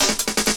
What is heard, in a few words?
LOOP SD; Ueberschall; Drum & Bass Resonance